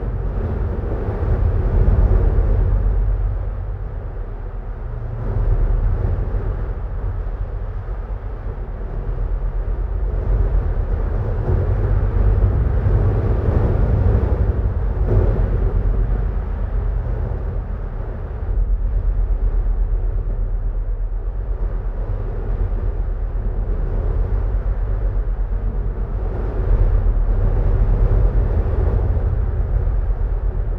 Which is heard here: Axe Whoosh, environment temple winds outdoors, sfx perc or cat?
environment temple winds outdoors